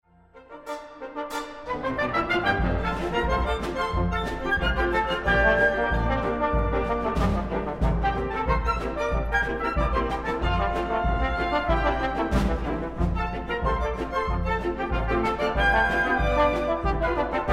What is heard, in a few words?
Ragtime Orchestra; Paragon